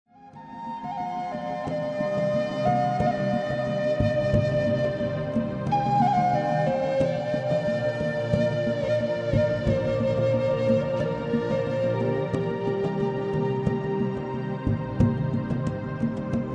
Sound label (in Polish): muzyka bez oplat